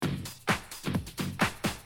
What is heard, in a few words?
drum loop